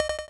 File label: deflect maybe; ReisenYoumuOuting